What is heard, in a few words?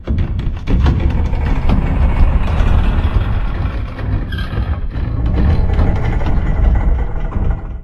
Metal Stress; silent hill homecoming 2008 gamerip pc ps3 x360